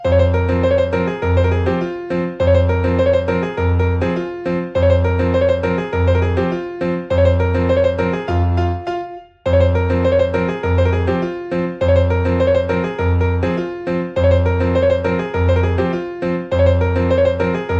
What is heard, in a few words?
piano